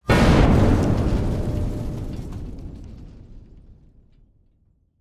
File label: propane explode